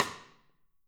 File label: Drums Percussion